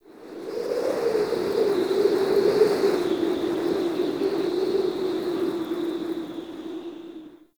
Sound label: Under City Skylight Wind Howl Gust